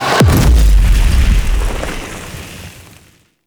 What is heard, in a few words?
Weapon Explosion; Enemies; Abilities; Ethereal; Warframe; Zariman; Void Angel